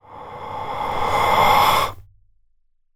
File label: Ghost Whoosh Male; Fox Audio Post-Production